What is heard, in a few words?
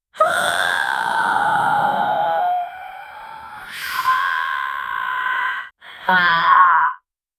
a low pitched guttural fe, zombie